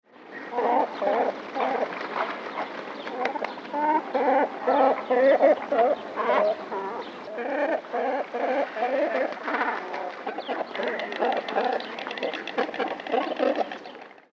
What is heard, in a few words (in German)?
kormoran diverse laute